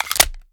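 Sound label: pistol reload fast extended mags large mag in; gmod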